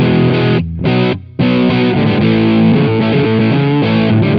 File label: guitar, Loops, Phonky